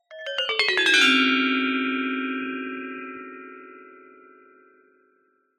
musical instrument gender small gamelan glissando downward